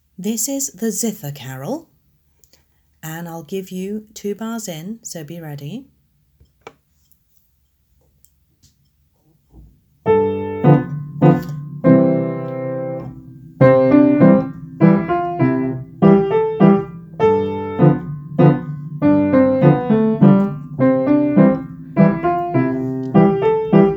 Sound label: zither carol 100bpm